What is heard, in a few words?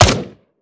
akilo47 smg; weapons; viper